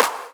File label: CLAP HOU, Dance Mega Drums; Best Service